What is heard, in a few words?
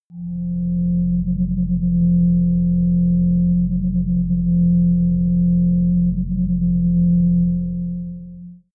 ancient alien